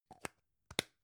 gum plastic box close soft

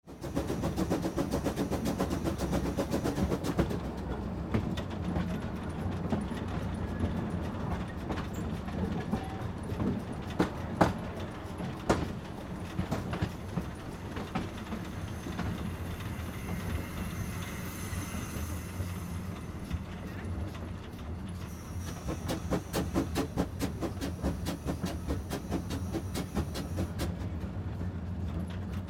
old train cabin inside stopping

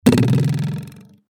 arrow impact

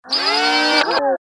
servo action style

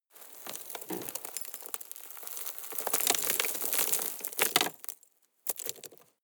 drop rock debris very soft

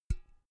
empty can damped hit soft

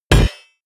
hit metal hammer large

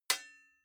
sword sabre metal hit

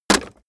wood hit hammer

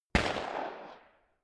rifle single shots

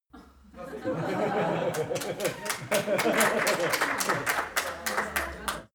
laugh applause

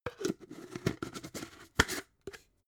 jar glass plastic close

